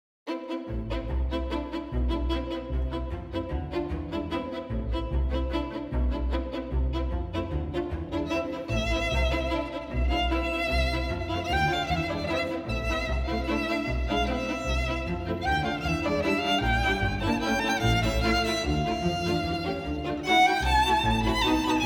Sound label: violin concerto excerpt